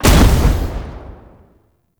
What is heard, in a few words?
Spear Gun Shot Proj Explosion; Hoplite